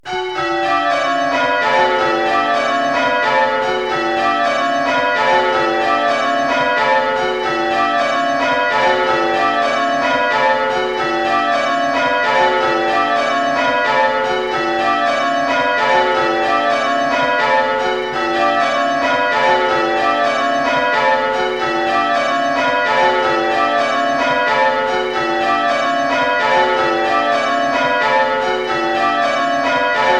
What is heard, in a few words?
bells, Treble bell is too quick at backstroke; Coggeshall Bells; 6 Bells